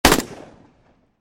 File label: weapon; Good Ol' Days